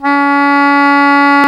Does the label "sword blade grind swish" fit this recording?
No